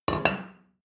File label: plate place table medium; sport-freizeit; restaurant-cafe